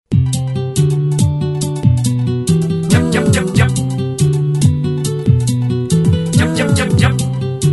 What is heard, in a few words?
rap Happy Birthday to you; viet rap